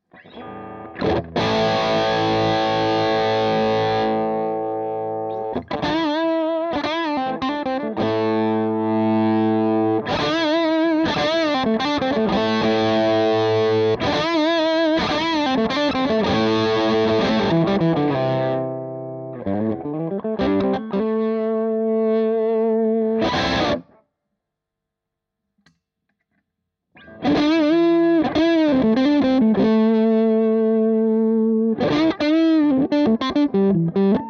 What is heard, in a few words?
Low Gain single notes